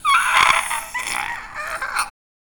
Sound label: slowly deflating balloon